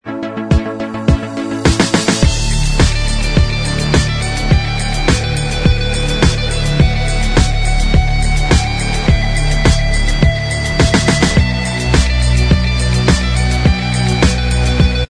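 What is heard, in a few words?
instrumental track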